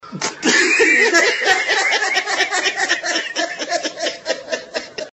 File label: Laughter masquerade